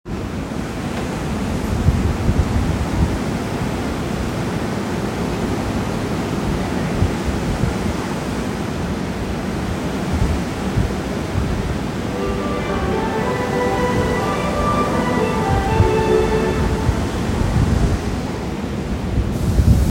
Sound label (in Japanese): 池袋線入間市西, train sounds; 西武